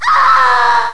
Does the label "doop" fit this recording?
No